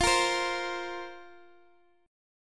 F Suspended 4th, down, Guitar, Open D

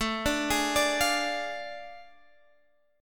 A Major 7th Suspended 4th Sharp 5th Guitar Drop D; chord

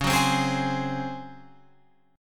Db Major 7th Suspended 4th Db down Guitar Open F; strummed

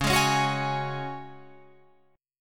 C sharp Suspended 4th C sharp down Guitar Open F; strummed

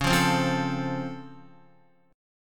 C sharp Augmented Major 7th, Guitar; strummed; 4F,4A,5C,5G,5C,6E; C#+M7; JGuitar

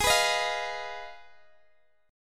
G sharp Minor 6th G sharp down Guitar DADGAD; G#m6 strummed

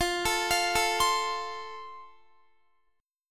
F Major, Guitar; F arpeggiated; x,20,21,22,21,20

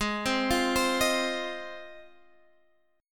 Ab 6th, Guitar, Half Step Down; Ab6 arpeggiated; Ab6 Chord

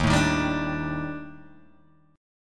Eb Major 7th Flat 5th Eb Guitar Open G

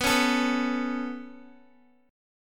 B 7th Suspended 2nd, Guitar; B7sus2; strummed

